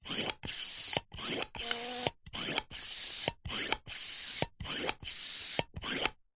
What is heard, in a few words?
plastic hand pump pumping pump end; zapsplat